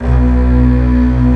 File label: STRING B0; E-MU Formula 4000 Series Vol. 5; Protozoa; Proteus1 Instrmt